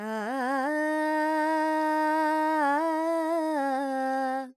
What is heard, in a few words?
Charukesi, Vocals, Carnatic Female, Alaps, G, Dry; Sample Banks